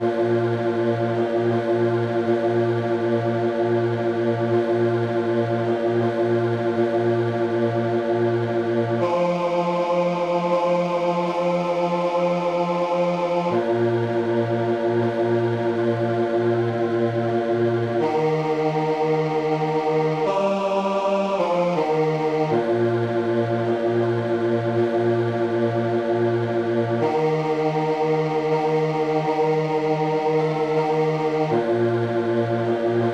silent night Bas; bedfordmodernsingers

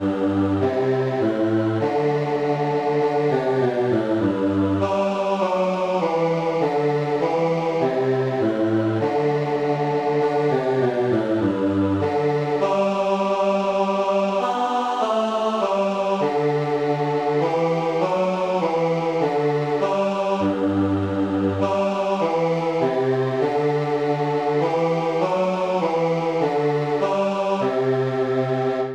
past three a clock Bas; bedfordmodernsingers

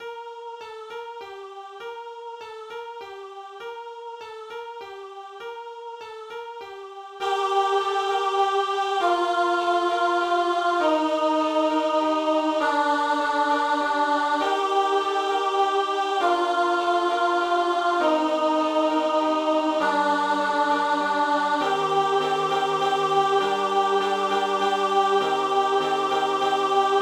carol of the bells Alt; bedfordmodernsingers

